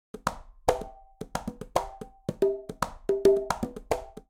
Bomba Quinto-223bpm; Latin Percussion; Ableton; Factory Packs

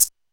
maraca; Drums; Ableton Foundation FreePacks

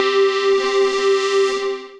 Glitter Synth Lead

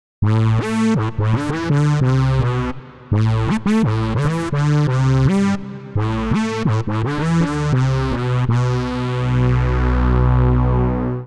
Brass A Minor 84 bpm; MIDI Clips; Melodic; Flyte; Ableton; Factory Packs; Chop and Swing

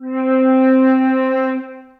Concert Flute Section, Orchestral Woodwinds; Ableton

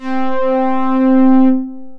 PWM Strings, Synth Pad; Ableton; Factory Packs; Synth Essentials; Analog

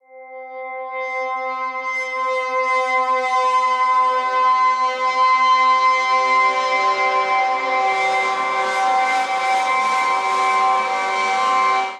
Feedback Drone Cm 80 Bpm; MIDI Clips; Instruments; Ableton; Drone Lab

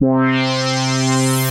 Legato Drone Stepper; Synth Pad; Ableton; Creative Extensions; Poli

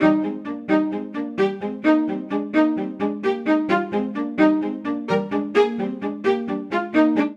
Phrase Cyclical Eb Major 130 bpm, String Quartet; MIDI Clips; Ableton; Factory Packs; Spitfire Audio

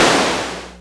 Clapbox Noise; Ableton Foundation FreePacks

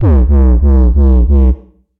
Monlog Bass; Ableton; Skitter and Step

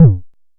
analog quick, Kickbank; mpk mini presets; Ableton Foundation FreePacks

